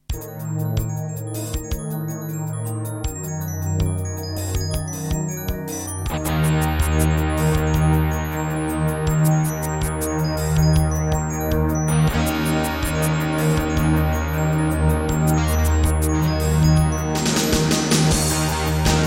Terratec WaveSystem SCW001-2; Battle Isle II

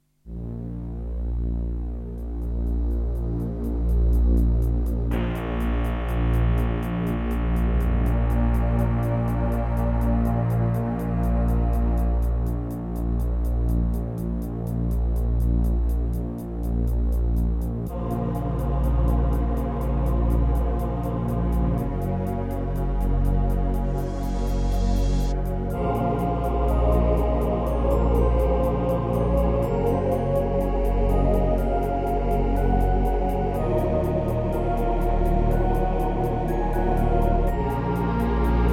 Intro Terratec WaveSystem SCW001-2; Dune II

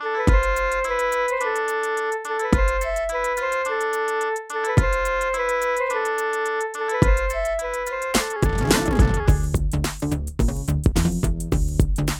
Yamaha MU5

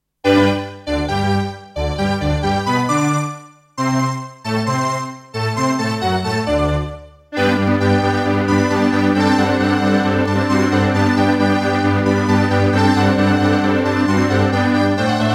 Doepfer GMX-1; classical; Mozart; Eine Kleine Nachtmusik partial

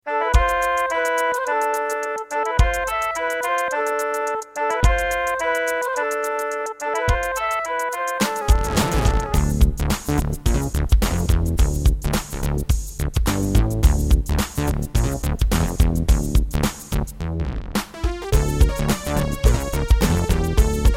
Roland SC-55; Lost Vikings

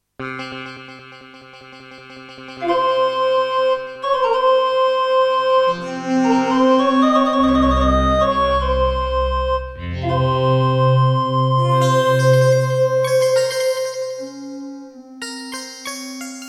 Turtle Beach Rio; Prince of Persia 2

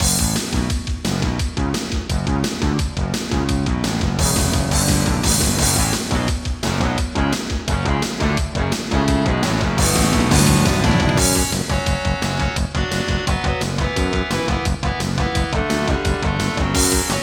Intro, Casio LD-80; Duke Nukem 3D

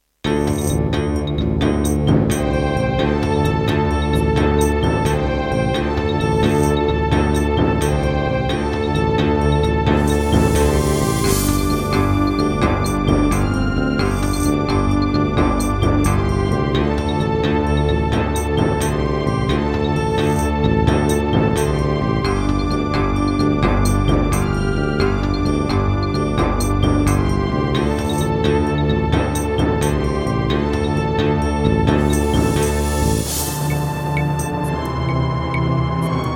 Hizon DB333, wavetable; Heretic

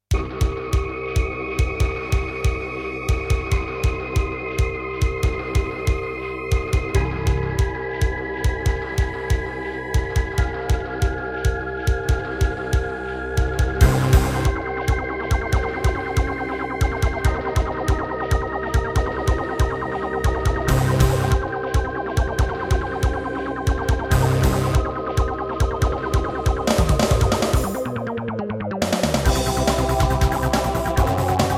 Roland SC-88; sigil doom episode 5

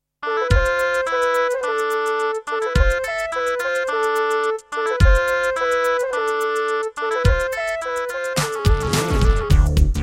Yamaha TG100

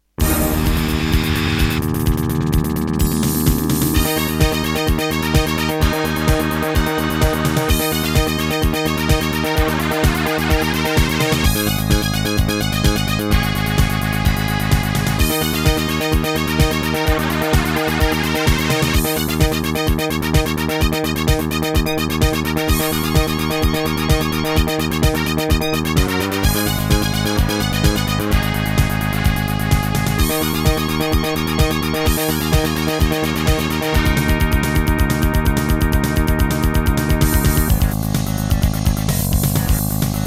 Terratec MiniWaveSystem SOWT-11; Wacky Wheels

